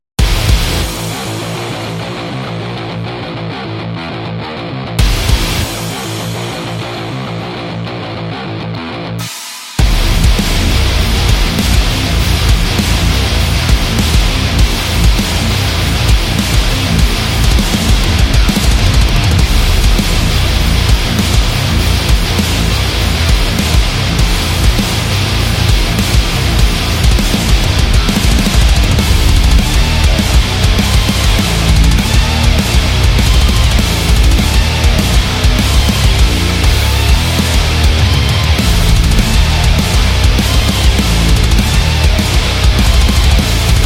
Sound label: Metal